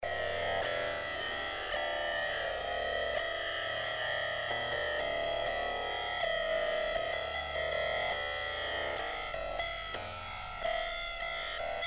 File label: electric gamelan ALIEN GAMELAN SLOWER SAME PITCH